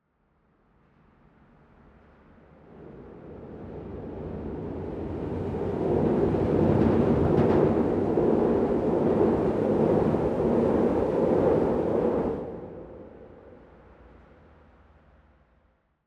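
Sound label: L train by open air track center of expressway under tracks perspective, Chicago Rapid Transit; The L; Griffin Sound Design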